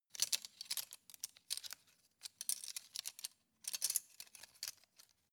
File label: cutlery plastic multiple rattle